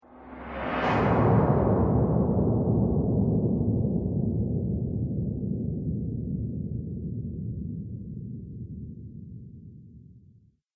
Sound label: Whoosh Transition Explosion; Whoosh Sound Effects